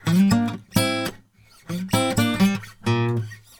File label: Blues Guitar; ILIO; Fretworks; LICK